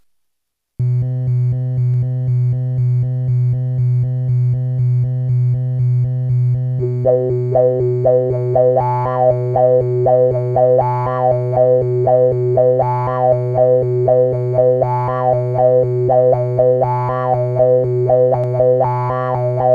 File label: twang; Scott Stites